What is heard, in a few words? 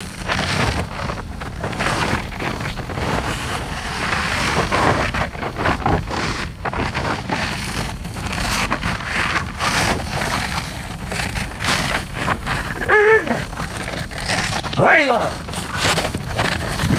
dog sled bumping over crusty snow